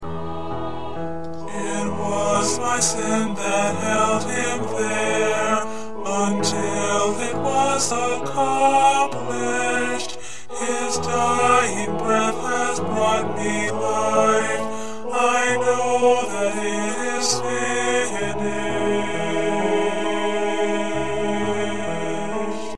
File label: Tenor, choir parts